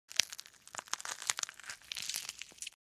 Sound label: Body part blood and gore